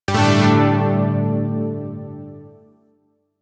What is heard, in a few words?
Shawzin Pent Maj Chord J; Chords; Warframe; Zariman